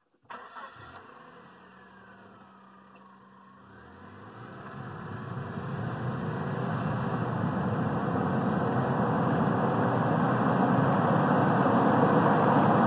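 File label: Small Car Engine Accelerate 55 mph, compact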